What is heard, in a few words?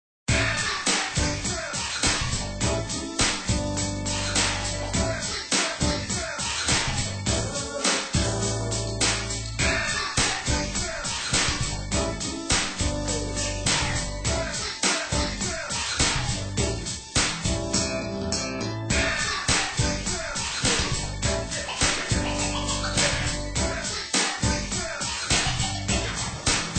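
Music Ambient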